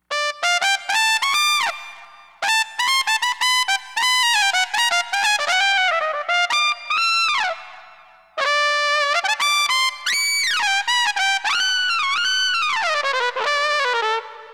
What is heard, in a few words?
trumpet